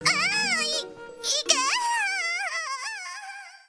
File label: Loud Moan; anime; gmod; darkrp